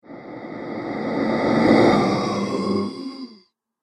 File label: Ghostly Halloween Monster